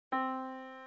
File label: yamaha Disk